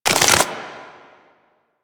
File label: Proto Infested Maggot Step; Warframe